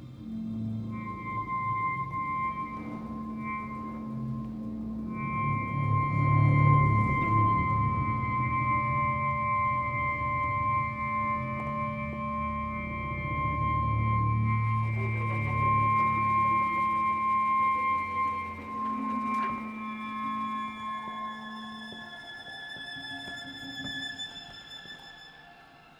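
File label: Clarinet multiphonics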